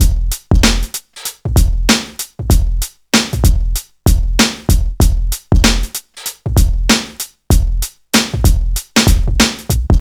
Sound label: 96 bpm drum beat f key